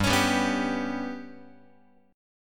G Major Flat 5th G down Guitar 4A 4E 5A 5D 5G sharp 6C sharp; GMb5 strummed; JGuitar